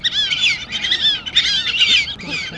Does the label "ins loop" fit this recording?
No